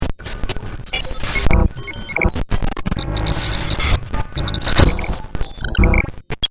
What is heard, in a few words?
temporal Noise